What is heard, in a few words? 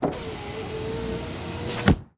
Transportation Car Monte Carlo Exterior Power Window Roll Up Half Way